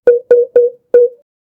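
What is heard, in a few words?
bip bip car sound in, city life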